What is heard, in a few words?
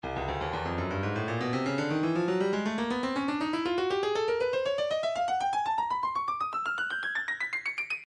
stylus breakbeat midi files 120 bpm club; Bosso Club A